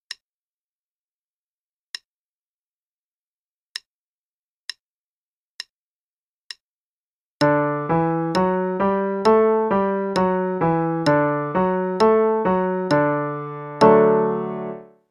D Major Pattern (qn=66); CM Level Prep; Lesson Books

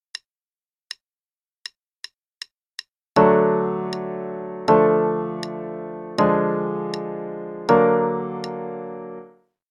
Chords on D; CM Level 2

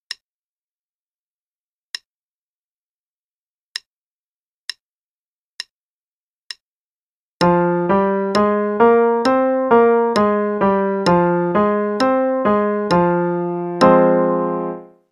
f minor Pattern (qn=66); CM Level Prep; Lesson Books

